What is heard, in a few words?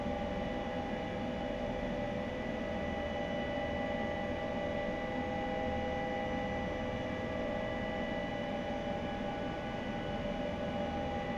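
H145 Ground Idle, helicopter; ec145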